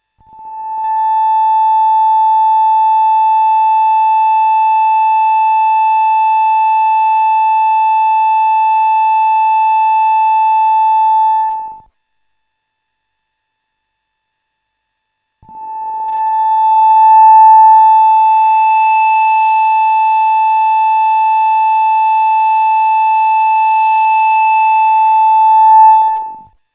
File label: SID triangle lp with reso